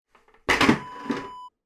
sauna water bucket turn around

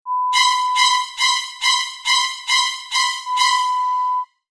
Psycho Strings, Horror Sound Effect

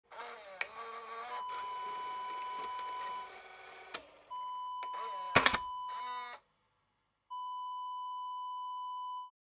camcorder tape eject

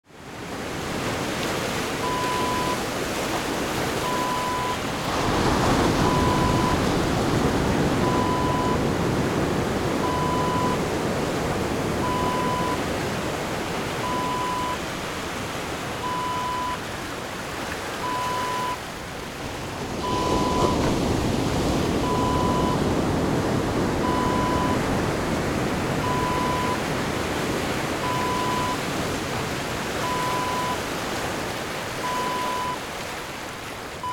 ocean waves

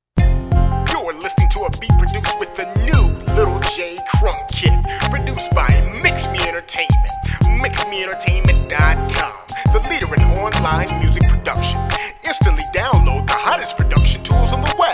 Crunk Kit; Chiglez

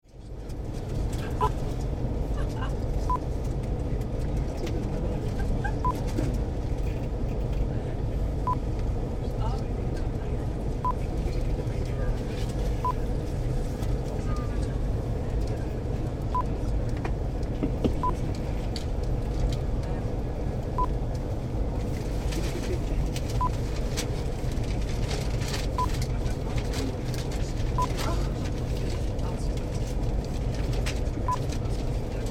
Onboard Modern Train Carriage At Station

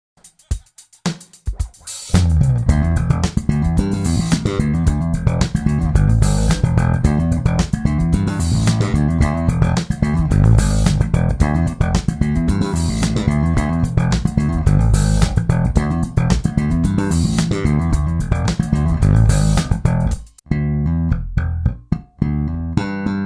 Slap with cross string hammerons

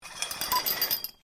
Drawing Curtains

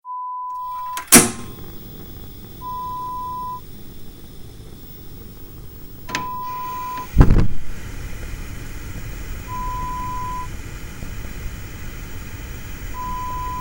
Gas Fire sound

